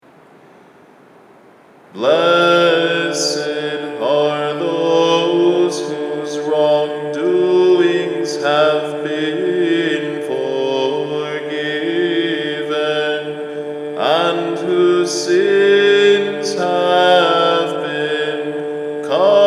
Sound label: Blessed are Those